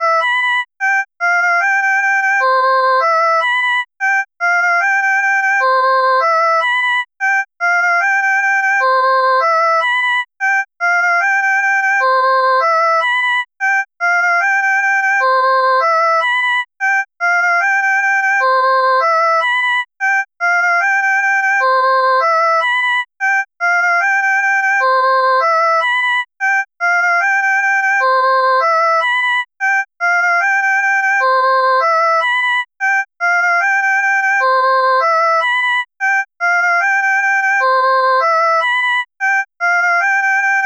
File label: music background; YokaiSurvive; GODOT